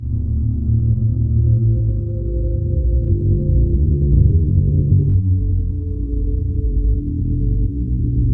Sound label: loop, techno atmos